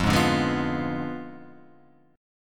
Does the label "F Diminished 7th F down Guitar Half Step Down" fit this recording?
Yes